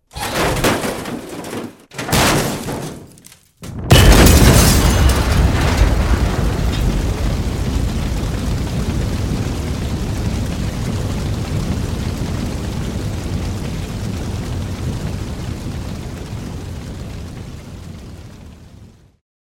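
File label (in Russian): машину сталкивают в яму она взрывается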